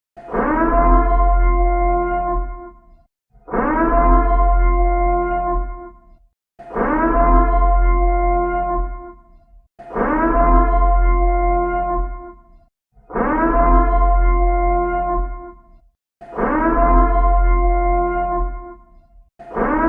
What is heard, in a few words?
Purge Siren Sound Effect